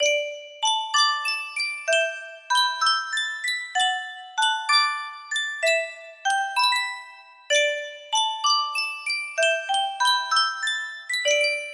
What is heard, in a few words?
superstar music box